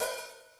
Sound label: Hi-Hat Open, Yamaha DD-35